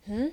Hm

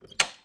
Old Metalic Door Locked

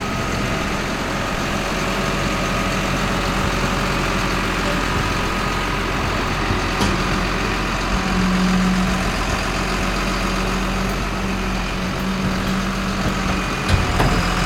Garbage Truck

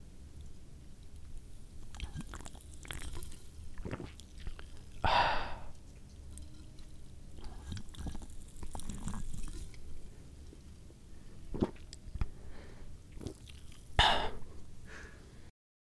Male Drinking From A Soda Soft Drink Can

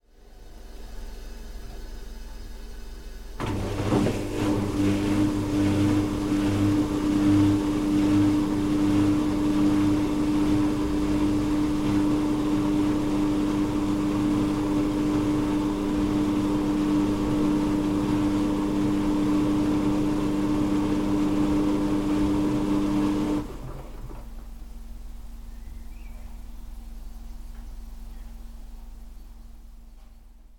Washing Machine

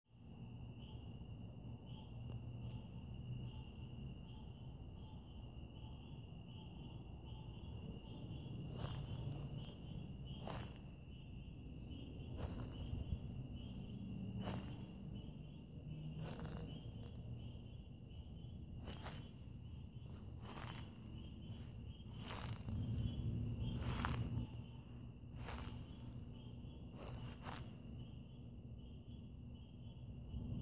Footfalls

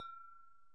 Glass

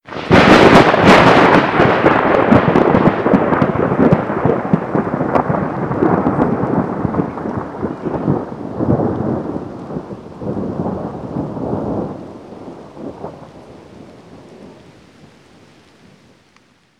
Large Thunder Crash

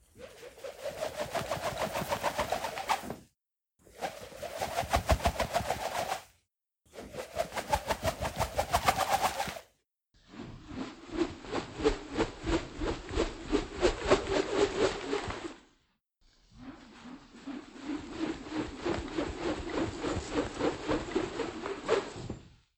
Lasso Rope

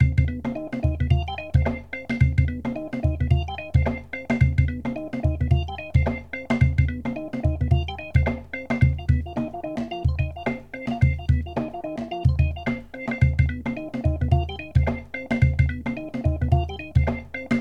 Chunky Piano Loop

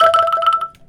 E4 Bandung Angklung Vib

